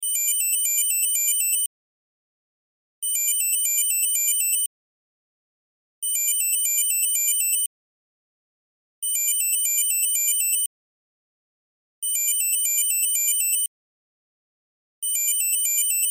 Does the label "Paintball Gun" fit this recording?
No